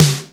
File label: Roland R-8 Snare, Drums